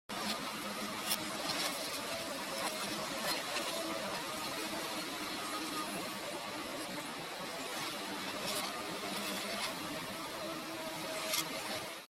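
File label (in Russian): абстрактное звуковое представление страха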